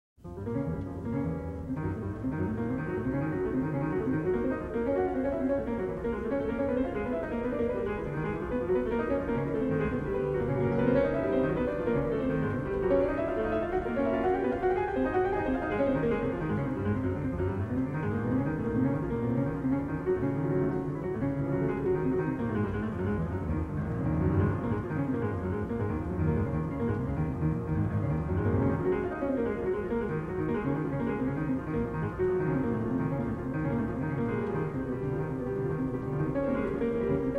Piano Sonata No. 2 In B Flat Minor, Piano Masters, Roll; Masters of the Roll, Vol. 15; Op. 35; 4th Movement; Fanny Bloomfield-Zeisler